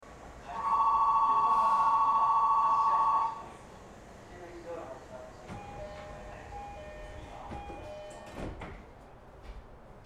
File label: sotetsu 12000, train